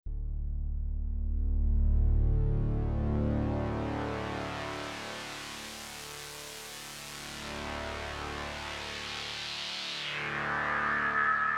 Bandpassfilter; KingKorg

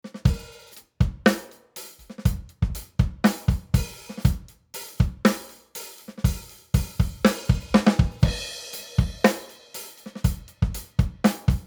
Drums ohne EQ; Gyraf Audio G14-S

